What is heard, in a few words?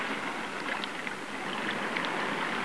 water